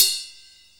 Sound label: RIDE, CYMBALS; Back In Time Records - Big Bang